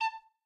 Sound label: flute; easter egg; golden tavern